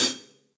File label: Hihat Pedal; Drum Hits; Standard Hits; Studio Basic; Ableton; Factory Packs; Session Drums Studio